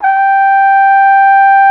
CORNET G4, MONO BRASS; Greytsounds VOL-1

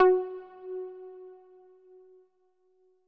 F#3, SYNTH WAVES; Music Store Sound Service; Einstein

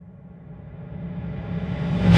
Reverse Hit, impact drum hits; Sonic Foundry (Sony Creative Software); The Electronic Music Manuscript Collection

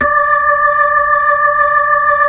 B-3, Brite; Keyboards of The 60's and 70's

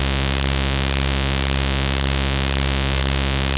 BASS C3, Jungle; Explosive Jungle; USB Soundscan vol.05; AKAI

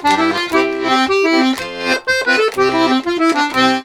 120 GIGUE, Complete Accordions; USB Soundscan vol.40; AKAI

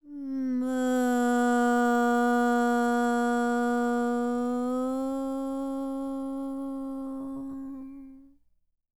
World Voices, INDIA; ILIO; Vocal Planet

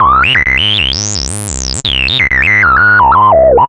Analoop, Industrial Analog

